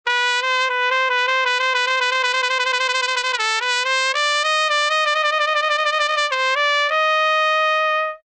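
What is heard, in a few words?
trumpet warble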